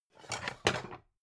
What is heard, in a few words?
pan stack slide soft; objekte; topf